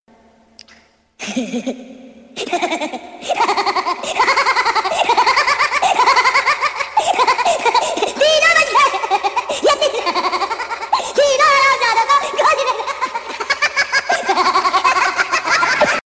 Baby Smile

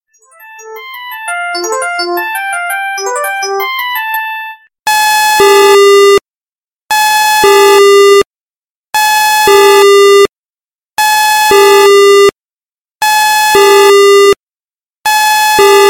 Loud Alarm Buzzer

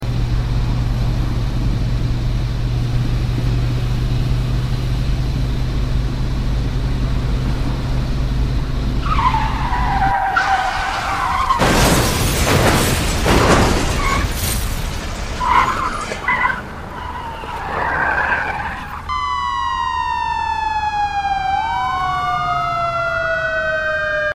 Moving Car

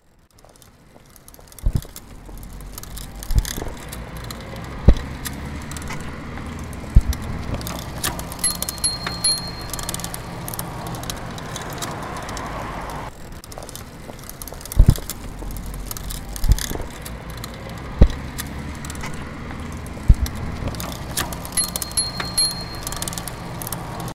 Typewriter Sound

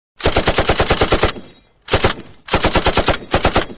Loud Gunshot

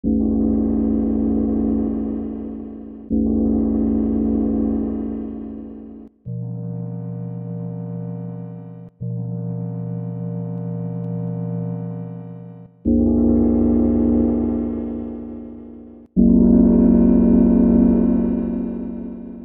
Gong

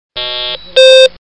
Pc Error Tone

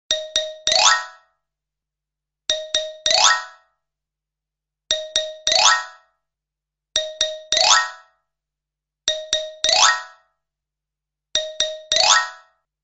Funny Cartoon Sound

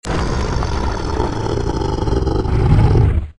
Dragon

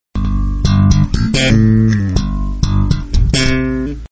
bass guitar rhythm

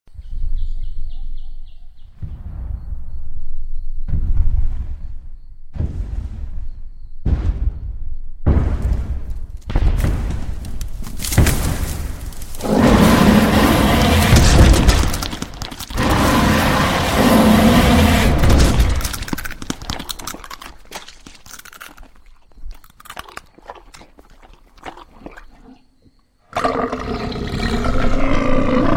Horror Movie Effect

